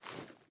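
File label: sniper reload extended mag cloth; gmod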